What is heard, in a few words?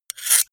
scissors scrape